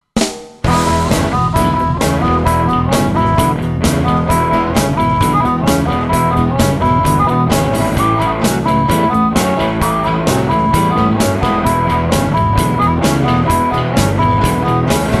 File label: big harp, ampli harmonica